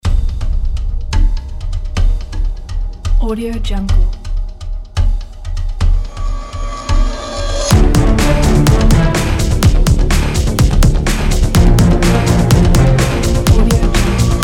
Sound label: teaser action ident